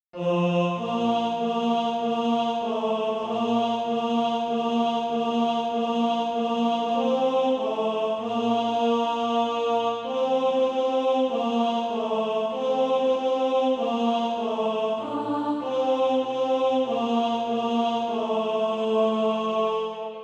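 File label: tenor, Practise Music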